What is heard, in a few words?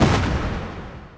explode; Manic Miners